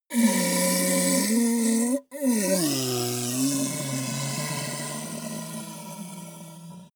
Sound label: ogre dying groan weak; kreaturen